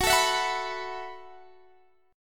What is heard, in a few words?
F sharp Minor 11th, Guitar, Standard; strummed; JGuitar